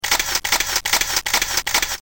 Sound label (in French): Appareil photo